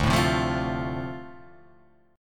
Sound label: D-Minor 7th D, chord